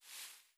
grass move

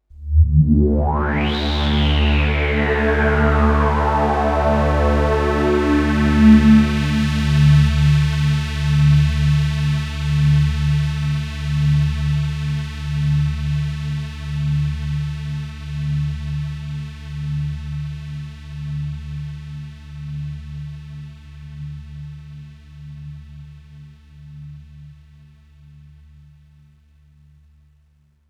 Oneshot Synth

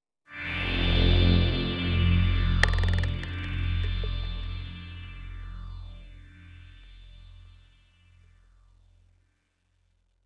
Oneshot Synth And Perc; zombie army 4 dead war; ps4 stadia switch windows xbox one

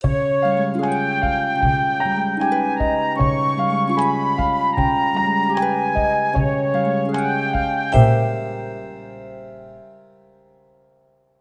Bari Sax

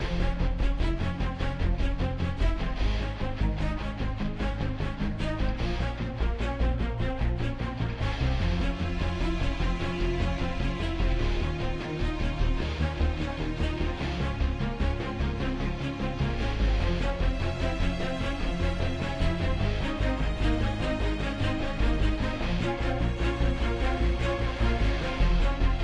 Ambiant; Iron Man 3 2D Version

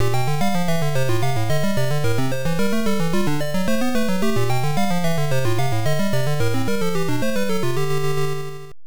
Block Clear; Lode Runner II The Bungeling Strikes Back; arcade; Irem M62

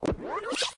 Rewind; friday the 13th killer puzzle

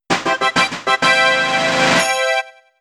Course Clear; super spamton 64

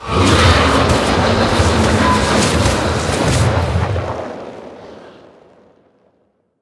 Defeat; Barbarians Jokull

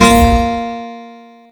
Guitar Chord; gamerip; cat quest ii

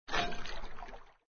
Strainer Lift; papas pastaria to go; android

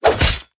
Fight Attack Sword; Mighty Party; Android

